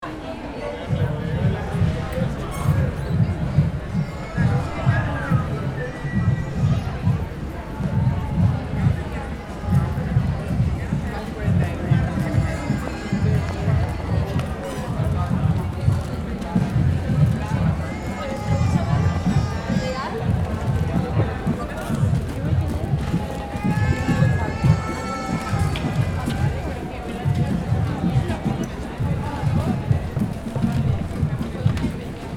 City ambience street with a busy drummer in a parade